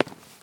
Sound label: stairs step; Golden Crayon Sounds